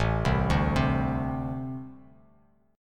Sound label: A-Minor Double Flat 5th A Bass Standard; arpeggiated; JGuitar